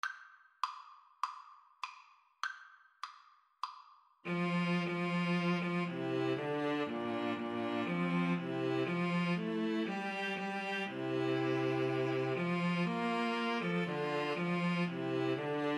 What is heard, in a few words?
String trio